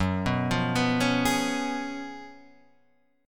F sharp Major 7th Suspended 2nd Suspended 4th F sharp, Guitar; 3,3,4,5,3,5; Half Step Down